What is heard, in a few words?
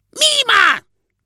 fail; grinch cheater